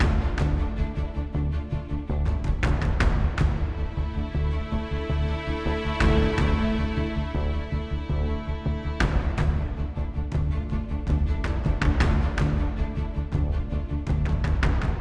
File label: Mood Mid